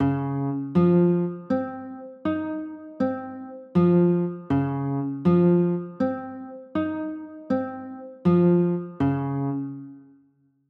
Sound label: d flat maj, chords; d flat maj9